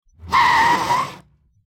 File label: sliding tires; sliding car sound; teststrecke-game